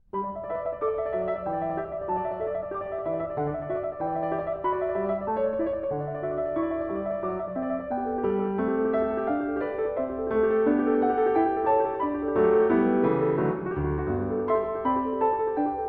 Goldberg Variations, Variation 28 a 2 Clav.; Bach; Zenph Re-performance; BWV 988

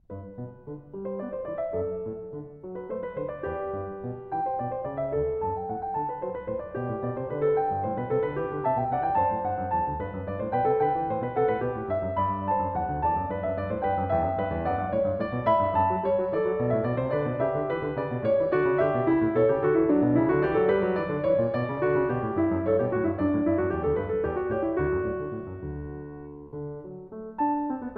Clav.; Stereo; Goldberg Variations, BWV 988 Variation 3 a 1; Canone all'Unisono; Bach

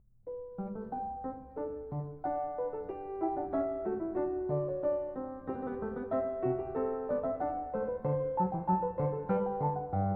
Clav., Binaural; Goldberg Variations, BWV 988 Variation 18 a 1; Canone alla Sesta; Bach

